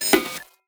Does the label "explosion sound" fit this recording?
No